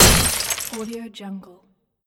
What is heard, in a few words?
glass smashing